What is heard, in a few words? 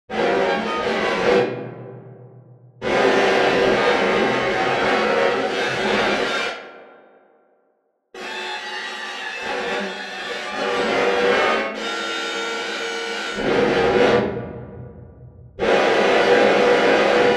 String Studio VS3